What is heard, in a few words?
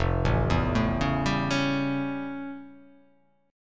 F Augmented Major 9th F